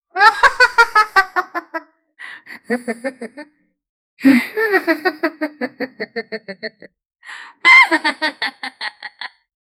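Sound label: cartoon style goddess laugh; radiant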